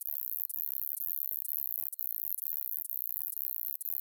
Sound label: song, katydids, neduba macneilli